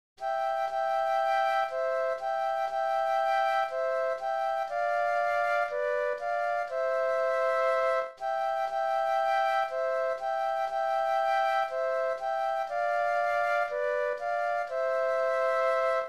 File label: Flute Duet; christmas